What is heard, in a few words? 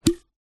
foley cork stopper remove from glass jar